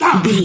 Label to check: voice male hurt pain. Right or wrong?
Right